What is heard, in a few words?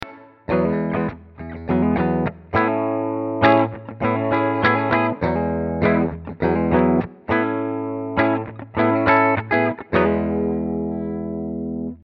Clean